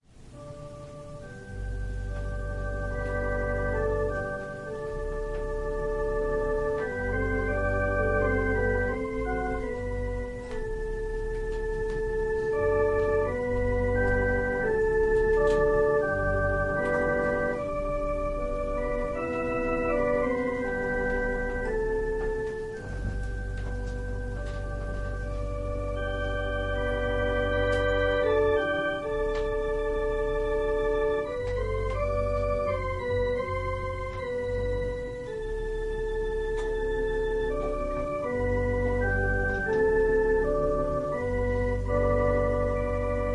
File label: Organ and violin prelude